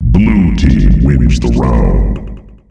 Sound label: zombie; cstrike